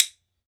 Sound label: WOOD BONE, Celtic Percussion; E-MU Producer Series Vol. 7 – Old World Instruments